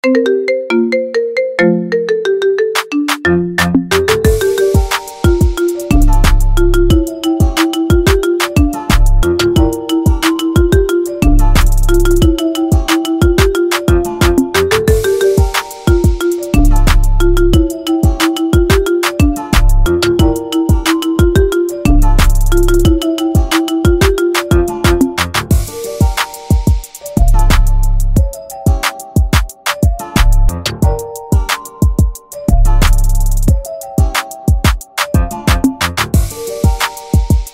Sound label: Marimba